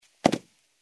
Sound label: Jump in heels on a carpeted wooden floor; steps